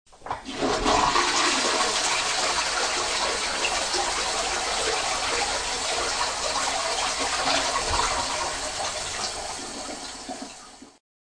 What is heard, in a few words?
toilet